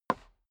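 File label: step boots wood creak slide short, footsteps; schritte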